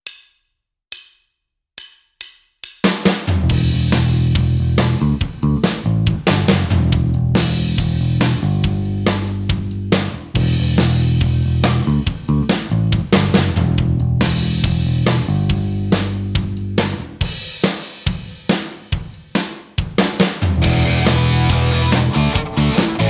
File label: backing; Guitar Techniques 2019 Issue 290 January